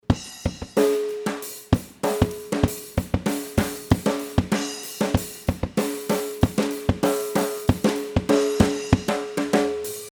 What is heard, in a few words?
Beat